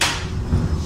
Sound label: door Unlock, Metro